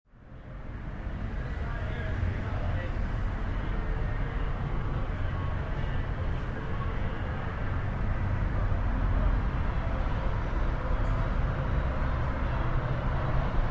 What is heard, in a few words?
Distant Traffic Wash, neighbor